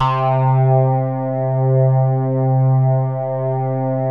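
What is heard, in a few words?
SOLID STATE, BASSES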